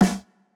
Jrock snare

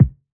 Hiphop kick; Reverb+EQ+Comp+Limit

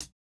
hihat, house

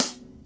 Rock hihat; Reference Beat

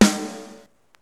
Rock snare; Reference Beat

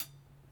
hihat, house

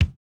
kick, house

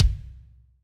kick, house

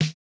snare, snare house

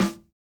snare, snare house

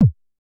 Korg kick; Electro; Micro korg

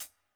hall resonance hihat

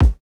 Electro kick; Reference Beat

